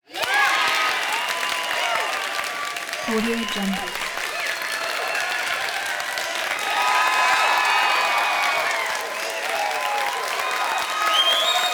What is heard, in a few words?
audience cheer constant big burst of applause; prosoundfx